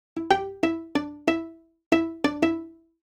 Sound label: strings, analog funk